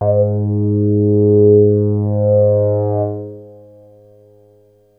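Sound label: bass G#1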